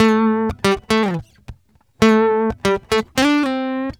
GUITAR SALSA

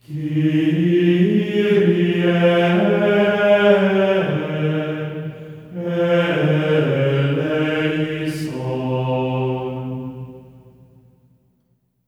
KYRIE F4, voices; Spectrasonics; Symphony of Voices; GR KYRIE

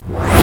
Paper Tear

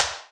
Hi Five, Electric Snare, Yamaha DTXpress

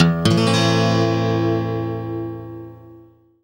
STL STRNG CRD; Best Service; World Colours

